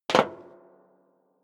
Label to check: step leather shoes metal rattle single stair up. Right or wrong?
Right